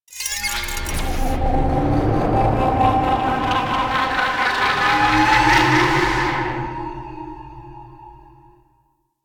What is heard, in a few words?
Conduit Attack Cast; Warframe; DE; Sentients; Sentient Trooper